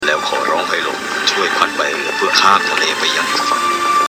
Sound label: cartoon